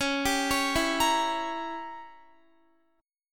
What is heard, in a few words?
Db Diminished 7th Db down Guitar; arpeggiated